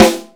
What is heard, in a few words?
Snares, Real